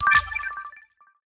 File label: Collectables Smurfberry Cluster Large